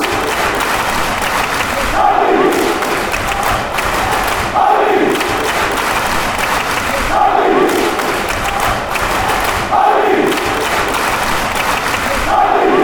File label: clap clap clap hibees fast